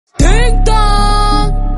ding dong

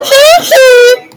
hehe

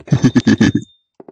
goofy ahh laugh

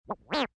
death dong

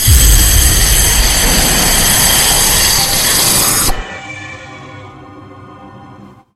jumpscare sound; a nights at fannys

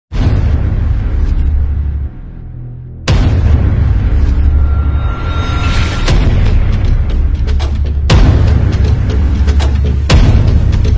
intense intro

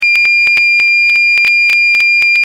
noise

